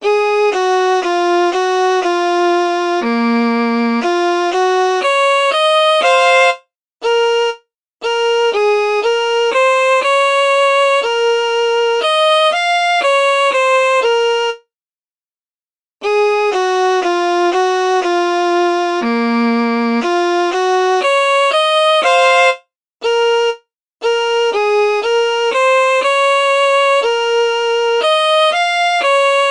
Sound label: violin; professor layton puzzles